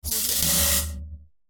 game futuristic electricity sound

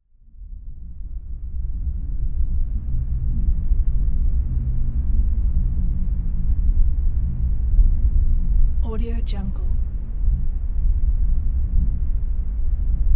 hum is low ambient

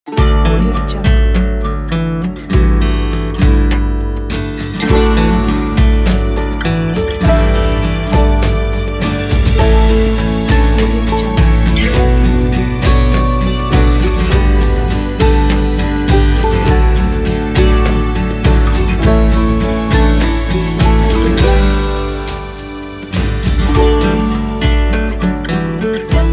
rugged power

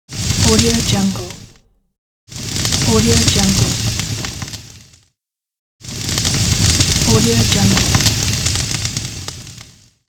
fire effect

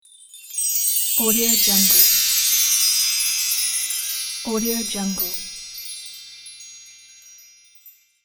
christmas chimes

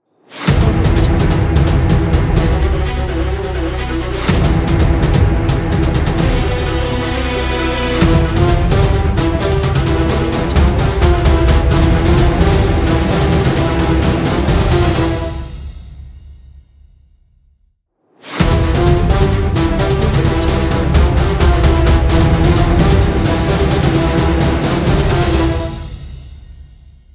cinematic epic logo; mrmastermind

